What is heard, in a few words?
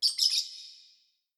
Ambience cavebird; adams venture origins